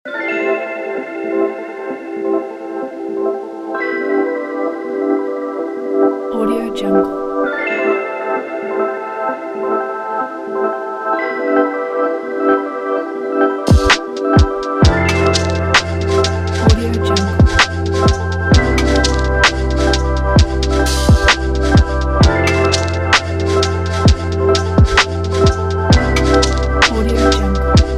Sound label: lofi chill; آمبیانس سرزنده; موسیقی بی کلام